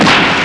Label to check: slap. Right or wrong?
Right